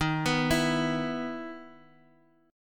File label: E 5th Eb; E5/Eb arpeggiated; JGuitar